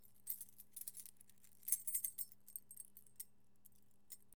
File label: Keys In Hand